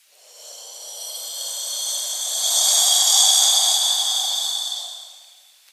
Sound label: Ghost